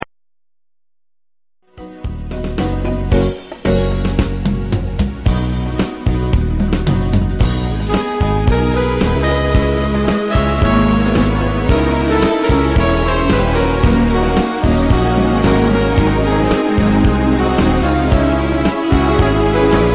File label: rumba; karibik